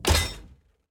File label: Iron Trap